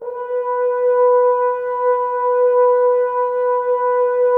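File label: brass, French Horns; Sample CDs; Miroslav Vitous; Woodwind Brass Ensemble; F.Hrns Links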